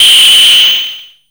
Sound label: WN FM HIT, ANALOG PERC; Zero-G Ambient 1